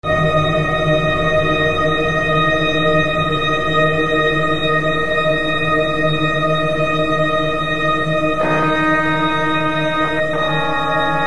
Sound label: fractal clarinet